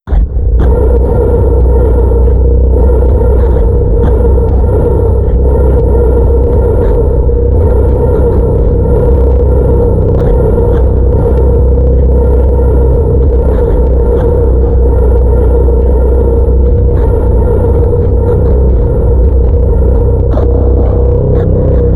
message packets multiple; hl2 ep1; ambient

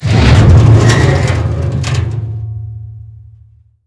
fan spin long; hl2 ep2; ambient, levels, outland

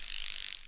buzz, forest; hl2 ep2; ambient, levels

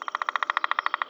peckr, forest; hl2 ep2; ambient, levels

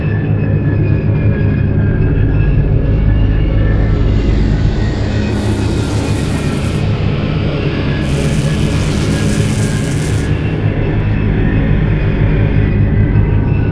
core uncontained loop; hl2 ep1; ambient, levels, citadel

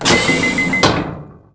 gun grate open; hl2 ep2; ambient, levels, outland

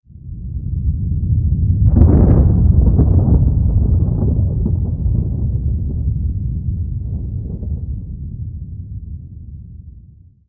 rhumble; hl2 ep1; ambient, levels, intro

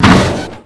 zombie on car; hl2 ep2; npc, fast zombie

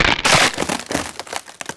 web door hit; hl2 ep2; ambient, levels, outland

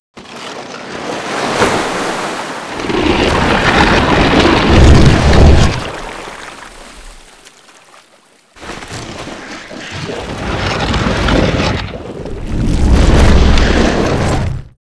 dog clear river; hl2 ep2; dog v strider

